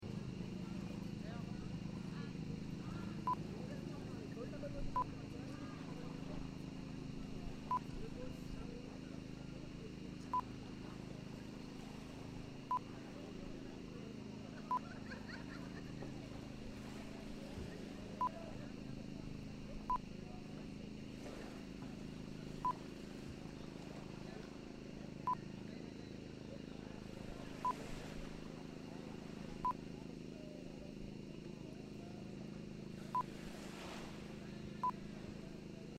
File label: Sabang Beach Underground River Philippines